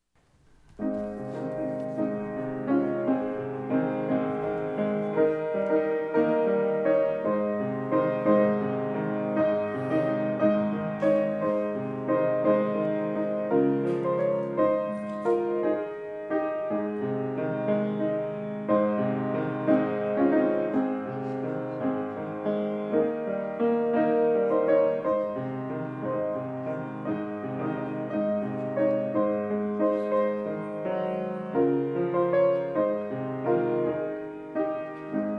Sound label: piano; more about jesus